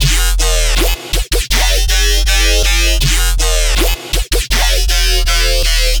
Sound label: Dubstep Drop Loop 160 BPM F Min, Bass Loops; Synths; Cymatics; Dubstep Starter Pack